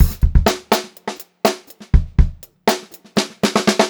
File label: Funk-Groove Drumloops, 122 FUNKY, R